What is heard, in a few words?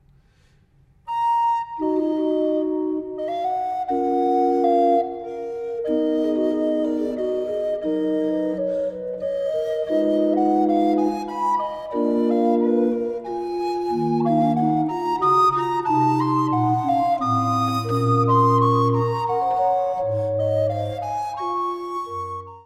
Organ sonata BWV 525 Adagio